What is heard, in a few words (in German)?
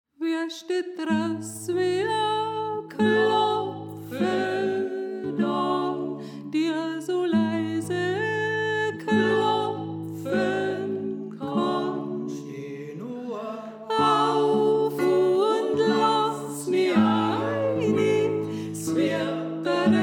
Sopran